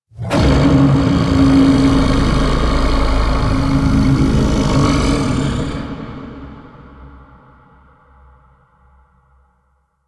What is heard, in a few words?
Sand Vocals; Warframe; Man In The Wall